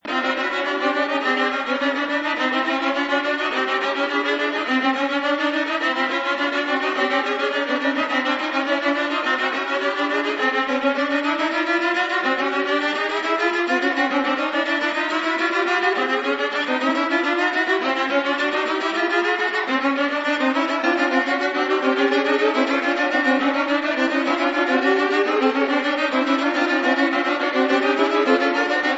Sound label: Microtonal